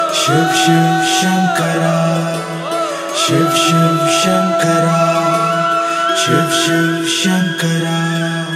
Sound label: Shiv Shiv Shankara Message Tone Ringtone